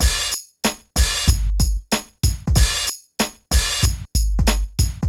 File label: PHATT BEA